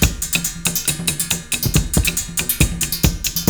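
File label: AFRO RHYTHM; Northstar; The Wizard VOL-1; AFROSIS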